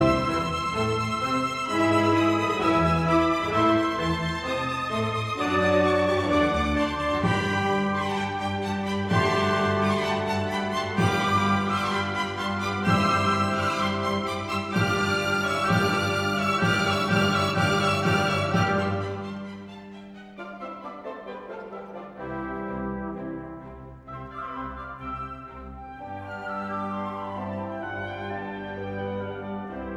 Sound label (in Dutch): Allegro pianoconcert; Mozart; No 21 (Elvira)